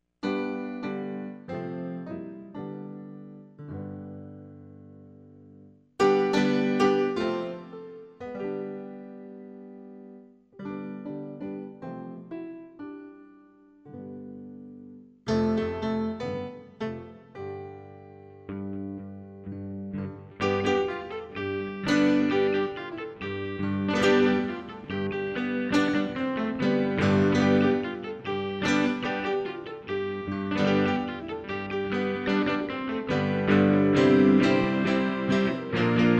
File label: midi dance